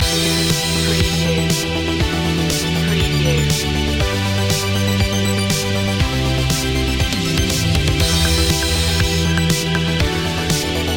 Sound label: 80s gamer loop; Electronica / Electropop